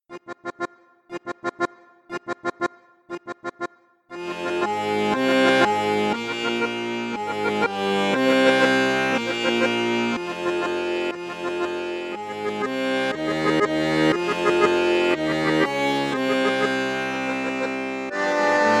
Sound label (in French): registre basson octave grave basson, accordeon; cavagnolo professionnel 10